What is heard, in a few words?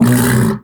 Undazoa Vocal Tranq Grunt; Warframe; Hunting Critters; Characters